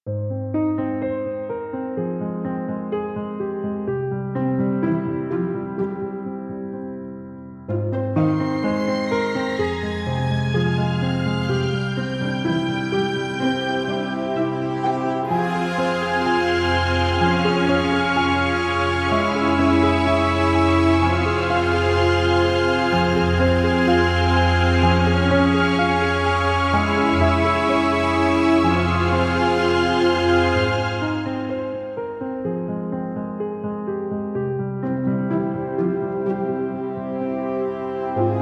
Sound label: Exotic Adventure Sumptuous Strings